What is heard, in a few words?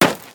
Dirt Run; moul